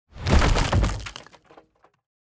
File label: Unstable Bat Wood Item Hit; TANK; 3Souls